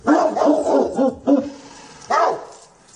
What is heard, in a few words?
Big vicious hounds; SyrinScape; Missing Magistrate